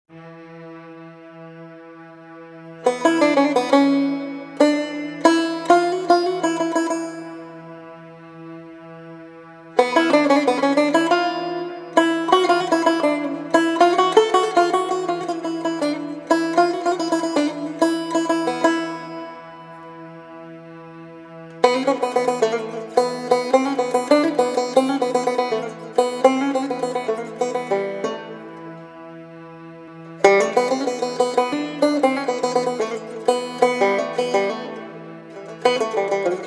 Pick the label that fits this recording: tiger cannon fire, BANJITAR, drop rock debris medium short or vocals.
BANJITAR